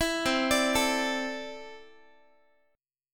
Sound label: C 6th Add 9th C down Guitar; C6add9 arpeggiated; 19,20,17,19,x,x